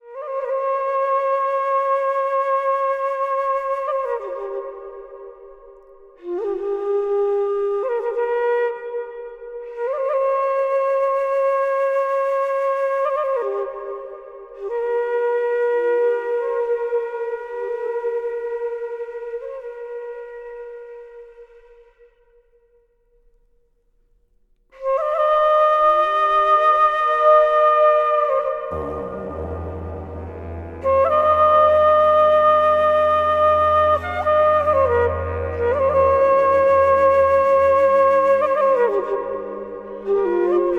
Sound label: Tibetan Meditation Music